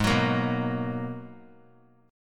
G sharp Minor 9th G sharp; G#m9 strummed; JGuitar

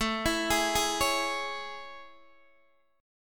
A Major 7th A, Guitar, Open D

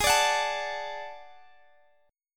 D Major G sharp, guitar

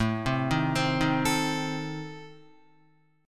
A Diminished A down Guitar; Adim arpeggiated; JGuitar

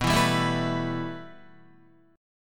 B 7th Suspended 4th Sharp 5th, Guitar; strummed; JGuitar

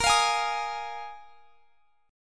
Bb 7th Suspended 4th Bb; strummed

